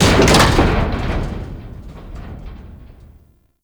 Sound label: Ship Hull Impact Medium; Hull Hit Effects; Warframe; RailJack